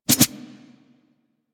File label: Light Glaive Spin Down; Warframe